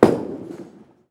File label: Bowling